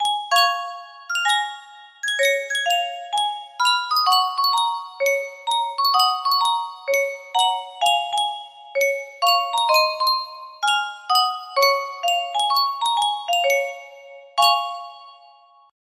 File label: yunsheng custom tune music box